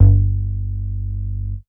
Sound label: MOOG BASS G2; Best Service Dream Experience